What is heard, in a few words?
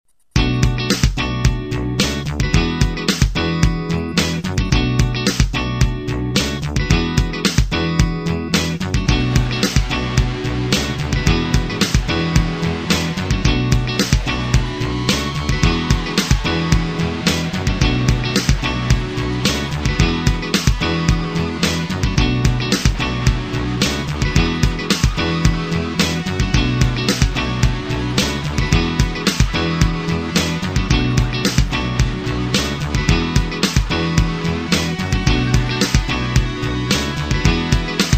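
Marshall 6101 channel 3 solo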